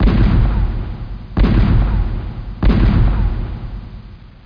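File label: jackpot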